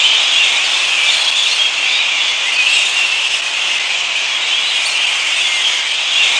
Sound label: FRUIT BATS; Zero-G Ambient 1; BIRDS + BATS